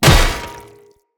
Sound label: Great Sword Impact Armour; Weapon Impacts; Player; Swords; Combat; Founders Great Sword